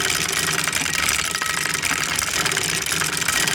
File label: reel spin